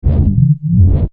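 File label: AMB LOOP, Sci Fi Atmospheres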